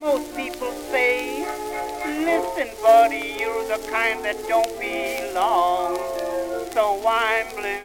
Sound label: Bell hop blues, jukebox